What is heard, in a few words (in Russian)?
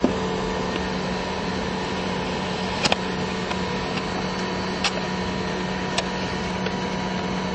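полное сканирование и выведение на печать документа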